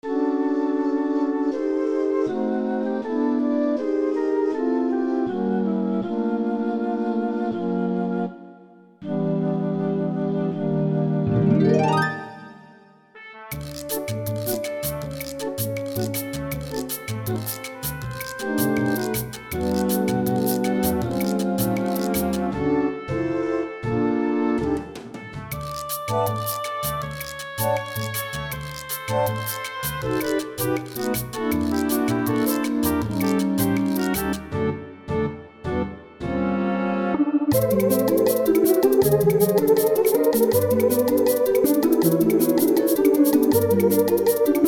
Essai film bossa

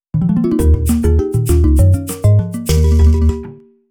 gagné

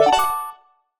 ingame jingle objet; level 22

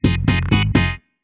Boxe jingle; onisep

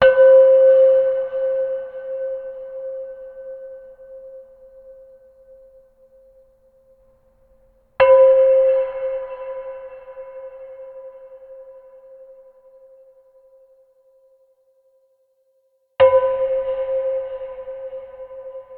cloches

